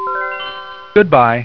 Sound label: CLOSE, PRINTER